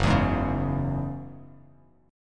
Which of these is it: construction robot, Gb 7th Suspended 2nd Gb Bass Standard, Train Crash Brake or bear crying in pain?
Gb 7th Suspended 2nd Gb Bass Standard